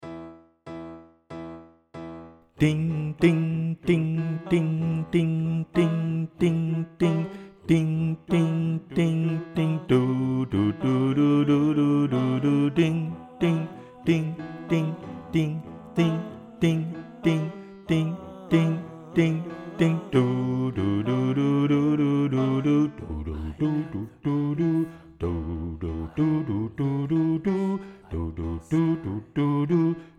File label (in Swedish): damtenor